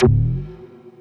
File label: Percs, Elektron MachineDrum; SPS1 MKII